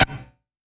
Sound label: Yamaha CS1x Drums